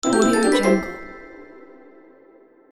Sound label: victory